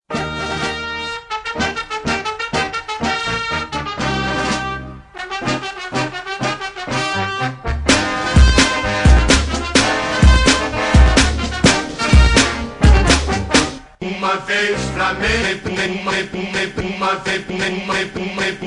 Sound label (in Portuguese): Batidao; Flamengo